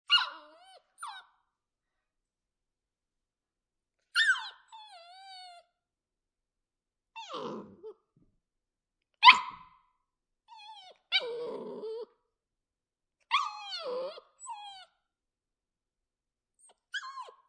Puppy Whimpering, Animal, Puppy Whimpering, Crying, Three Month Old Labbe Female Puppy Dog